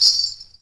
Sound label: PRC METAL, Afro Shakers, Ankle Pods